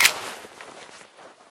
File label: dual pistol clip out; Left 4 Dead; Source Engine